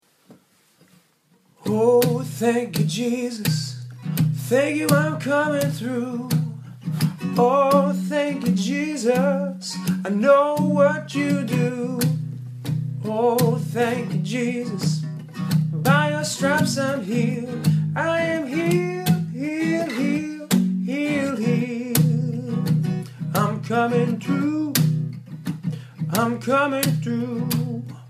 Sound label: Acoustic